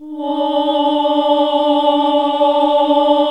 OHH D2, classic vox

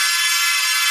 TABLURA

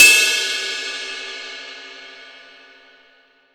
22INCH ZIL RIDE, Ride Cymbal

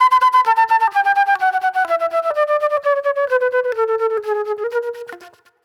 Wild Flute

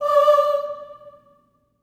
STACCATO D5, Symphony of Voices; Spectrasonics

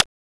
Tick; Genesis

